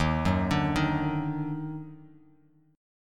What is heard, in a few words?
Eb Minor Major 7th Eb down Bass Standard, chord; EbmM7; arpeggiated; JGuitar